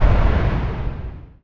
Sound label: rebound target, weapons; dota; marci